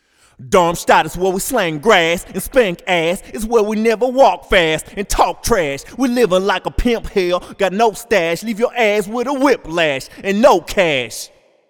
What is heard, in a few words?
fabfilter pro-r rap golden plate